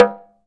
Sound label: TALK DRM, Heart of Africa; Spectrasonics